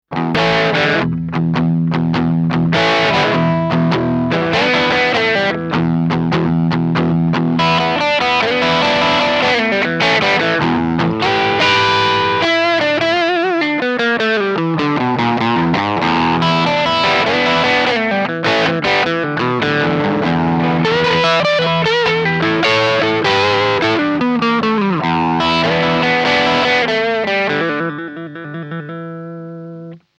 Maxon AD9 ambience delay

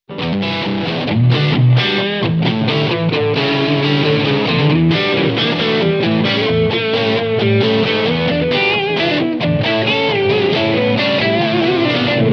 MAXON OD9PRO+ Blusy Boost

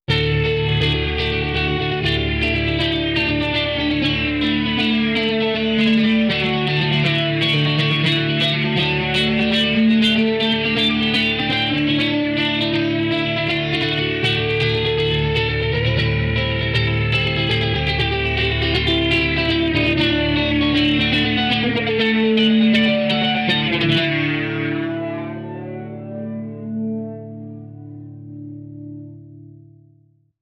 Maxon VJR9 jet cutting

